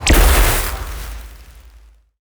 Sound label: Elect Shot